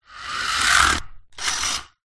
leisure radio controlled car approach stop stuck on rug; zapsplat